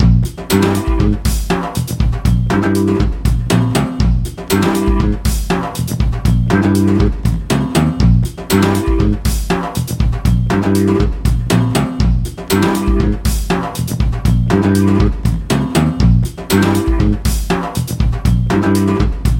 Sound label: solo loop